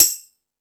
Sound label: Dance 2000, Drum Machines E-MU Pro-Cussion